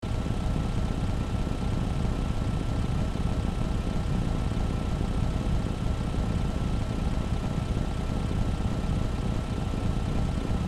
Car engine motor running idling loopable

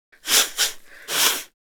Early forties male blowing nose

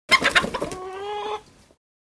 Chicken squawking flapping wings; animal

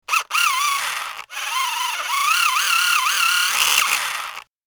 Remote controlled sport car road movement; rc toys

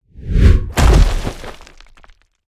Werewolf heavy landing impact

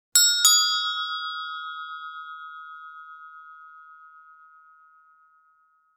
Medium sized handbells ringing together

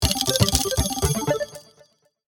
Slot machine casino bonus round intro

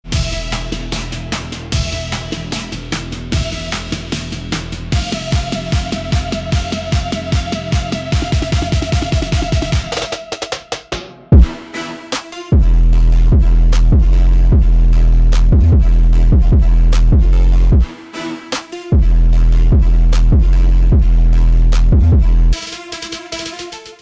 Bass pressure bass boosted moment

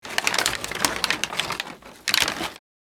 Christmas toys wrapping paper short

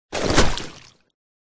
Lilo inflatable mattress thrown into water

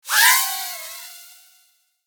Drone toy takeoff fly away whir; rc toys

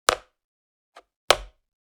Checkers game placing piece wooden board; leisure

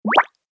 Light bubble pop alert

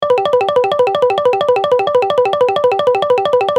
Casino slot machine reel spin loop

